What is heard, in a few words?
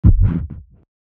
stealth in; midnight guns